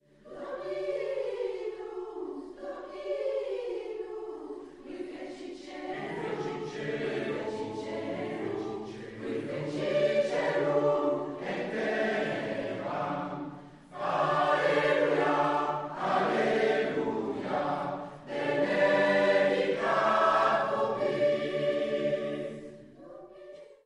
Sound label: benedicat; noel